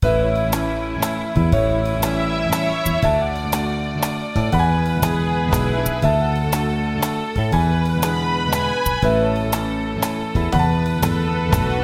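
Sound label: Country Waltz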